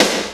SNARE, Skanky Perc; Hip Hop; E-MU Formula 4000 Series Vol. 1; Hip Hop Nation

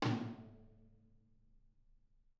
R B Rack Tom A Room; Toms; Sonic Foundry (Sony Creative Software) - Drums from the Big Room The Mixes; R&B One Shots

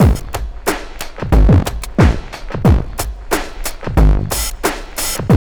TRIP 90, trip loop

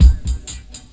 DRUMLOOP, Ragga & Dub Reggae

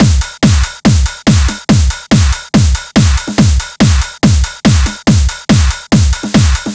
Break Beats, 140 BPM

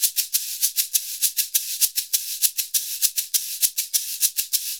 SHK, Shaker; Univers Sons; Basicussions

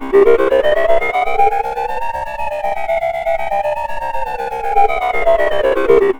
ARP SEQ; Big Beat Samples & More; AKAI; ESO SEQS KIT

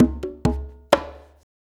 Congas, Perc Loops; Drum Tools

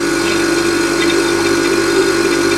MACHINES

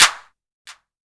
clap, Korg Electribe ER-1; Kit 15

